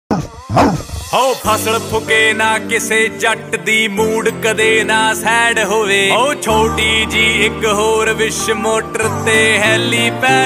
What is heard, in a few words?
Punjabi; ringtones